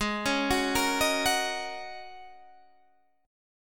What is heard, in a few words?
Ab 7th Suspended 2nd Suspended 4th down Guitar Open D